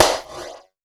Clap; Vengeance Samples

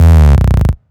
Synth Shots; Vengeance Samples

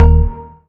Synth Bass; Vengeance Samples; VEE Synth Shots

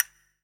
Minimal Perc; Minimal Percussion

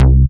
Bass C, Offbeat Bass

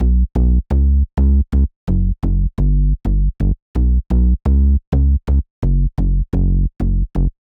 128BPM Bass Root B, TechHouse; Full Effect; Vengeance Samples; Bonus TechHouse Kits

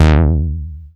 Bass Juno D#; Vengeance Samples

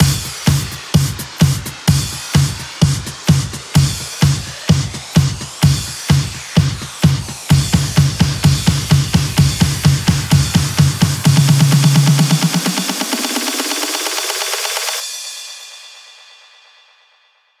128BPM The Big Bam, House Electro Fills; 8Bars; Vengeance Samples

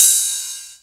Cymbals Ride; Vengeance Samples

